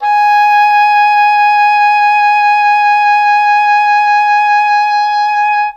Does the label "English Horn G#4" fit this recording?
Yes